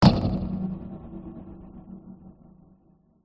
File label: sound design impact metallic sharp hit droning tail; zapsplat